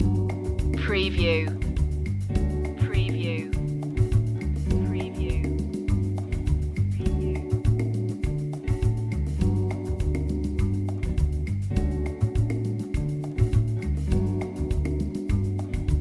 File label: happy rhythm loop